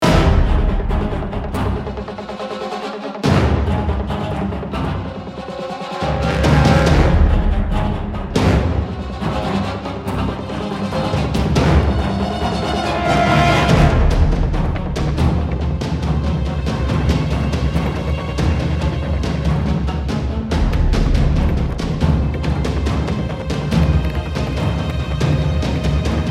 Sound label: Combat; Hivemind